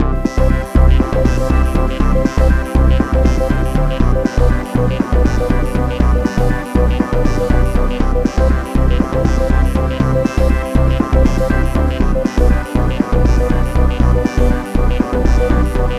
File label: synth